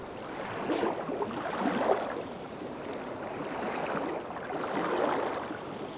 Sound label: lake waves; environment; Tribes2